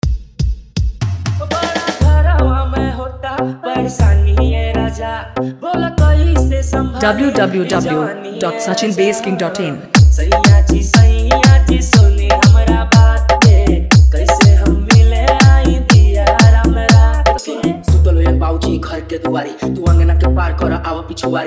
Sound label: Vibration Mix